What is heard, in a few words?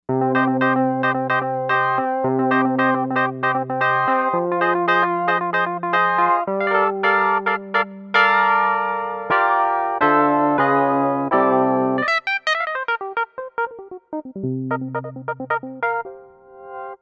Dirty Mute Keys, Novation UltraNova; Factory Patches